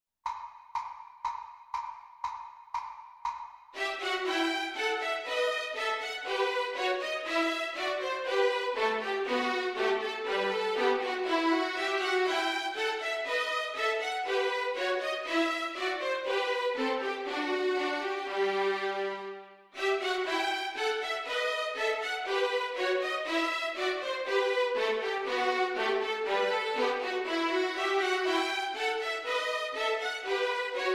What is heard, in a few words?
Backing Track; Bourree in E minor; ViolinSchool